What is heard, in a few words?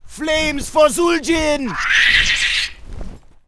Troll batrider Warcry; warcraft3